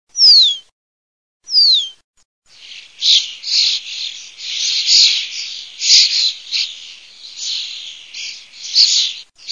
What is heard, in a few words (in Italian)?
storno fischio